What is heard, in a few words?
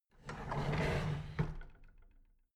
drawer big clatter close regular